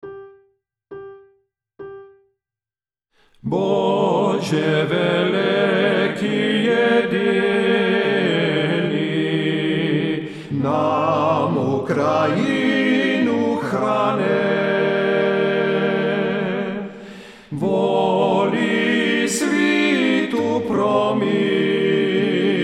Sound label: Prayer for Ukraine, Tenor 2, Bariton; TTB